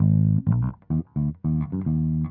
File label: bass